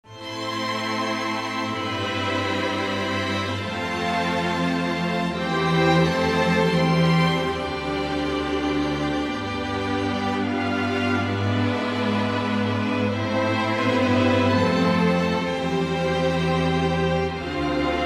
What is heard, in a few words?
Serenade for Strings; P.Tchaikovsky; Sondeckis; Pezzo in forma